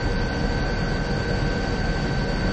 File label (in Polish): metal szum